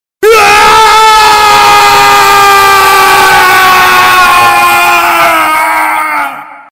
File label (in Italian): urlo straziante, scary